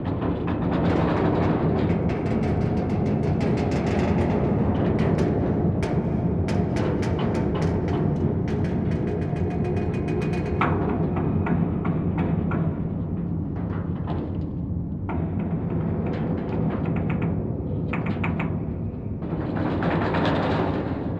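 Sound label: ambience elevator shaft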